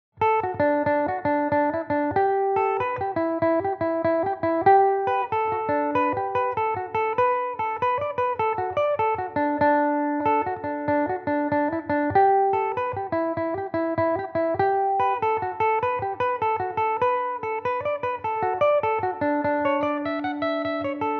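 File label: D major, Irish mandolin; Belmuse EM-190 electric mandolin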